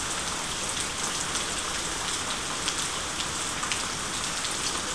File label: rain